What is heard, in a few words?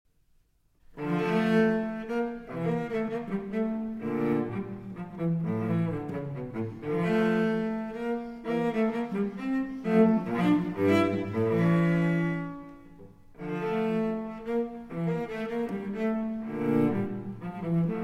Cello Suite in D Minor, Menuett I Menuett II; Cello Suite No. 2; BWV 1008 V.